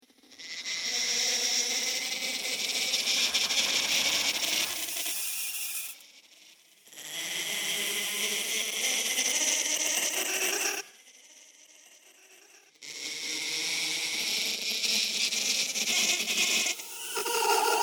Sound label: Laughing Deamons